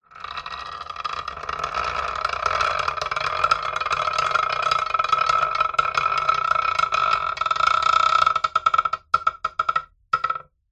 industrial lever metal large heavy slow movement creak; zapsplat